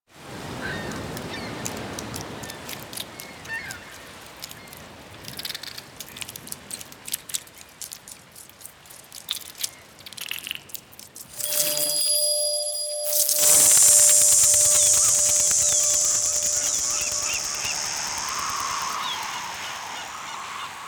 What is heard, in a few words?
Insect; Eidolon Plains; Cetus Intro; Cinematic SFX